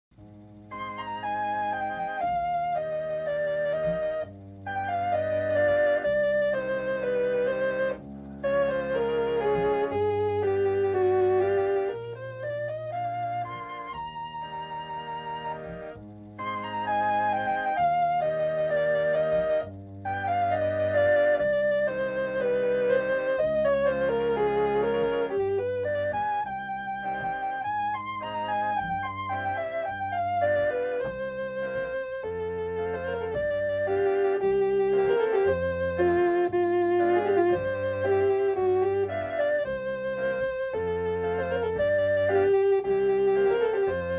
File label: Poignent Melodic Prelude; Preludes